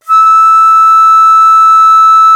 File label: venu E5, India winds; E-MU Producer Series Vol. 7 – Old World Instruments